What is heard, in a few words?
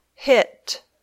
hit